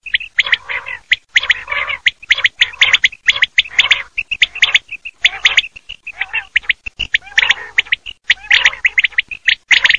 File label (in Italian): QUAGLIA